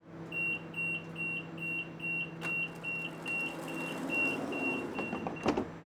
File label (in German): Bahnhof Zugtür Signal; Buchloe; 24-Bit 192 kHz